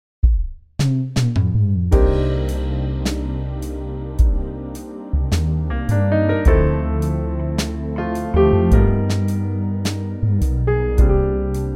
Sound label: Cm Smooth; key - Cm - vocal range - G to Eb